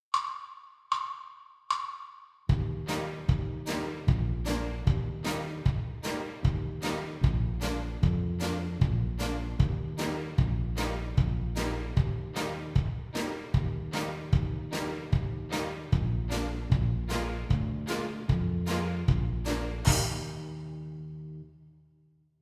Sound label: Rhythm Prep